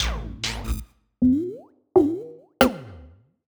Bpm138 Reso Perc Loop; Perc Loops

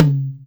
Toms

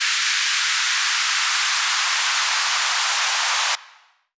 FX Misc

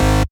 Bass Shot G#

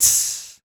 Human Beatbox, Beatbox Hits; Loopmasters; Vocal Hits And Kits; Human Beatbox 2

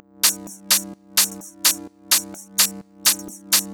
Loop, 128 BPM

